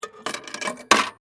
Arcade Game Insert, Technology, Arcade, Game, Insert, Quarter, Into, Slot; BlastWave